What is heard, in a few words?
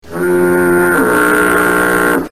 stinky horn sound effect